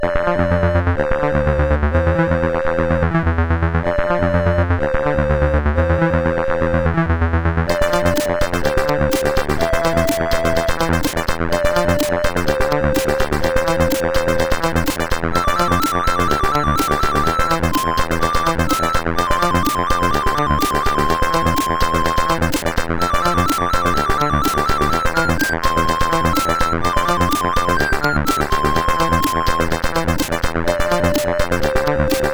Hires Demo Kit, CSG8580R5; hvsc

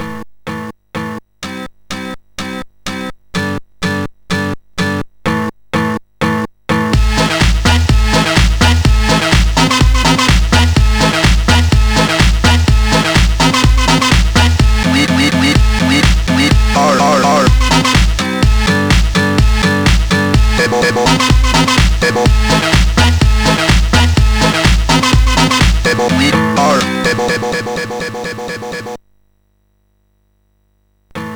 tune, MOS6581R4

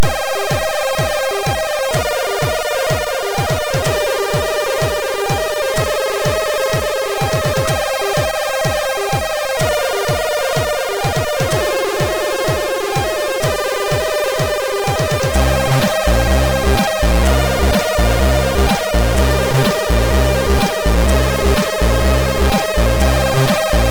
sid, MOS6581

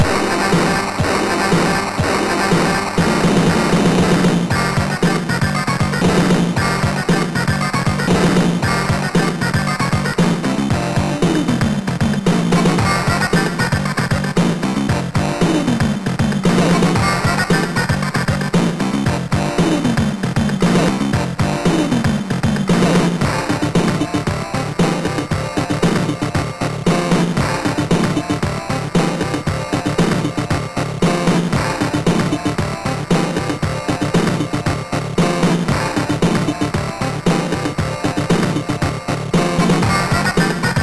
Digi, sid MOS6581R4; TBED

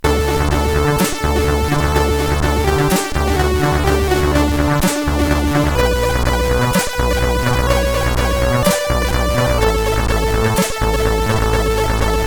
Steps, sid CSG8580R5

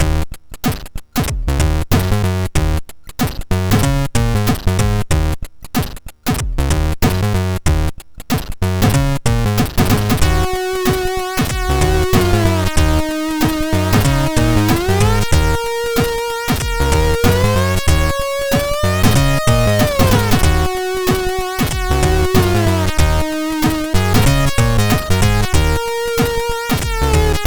Intro sid MOS6581R4; Checkers

